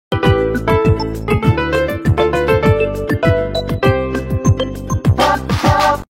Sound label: Dance Sound Effect; Koopa